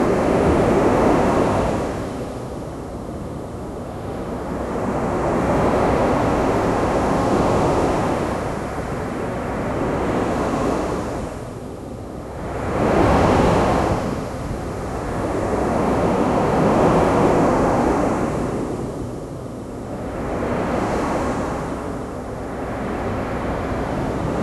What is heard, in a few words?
loopable relaxing miami a, city